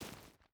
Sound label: footstep barefoot stones walk; Rust